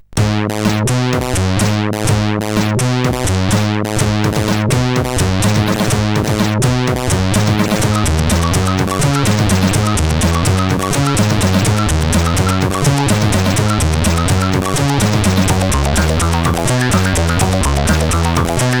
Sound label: Intro, CSG8580R5; Megaunit